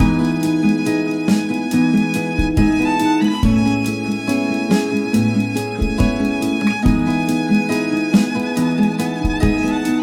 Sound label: Acoustic